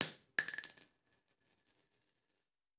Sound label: Bullet Shell; Sound Ideas; Guns